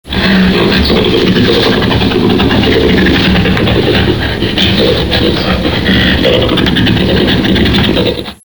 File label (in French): gorille gorilla; WWF Rangerclub